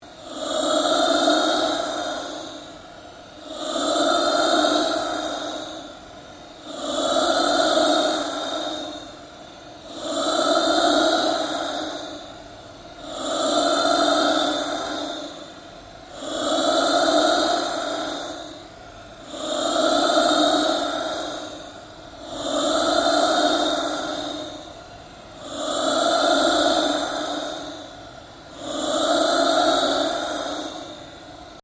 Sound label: Ambient Drone Slow Sweep